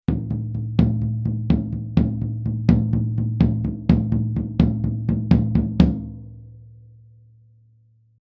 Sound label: TOM Sennheiser MD421